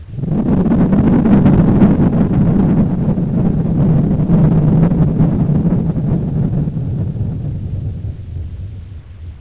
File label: city skybeam